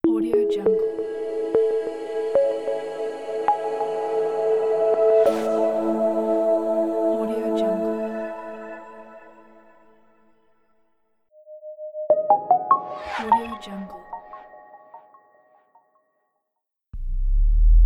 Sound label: digital logo pack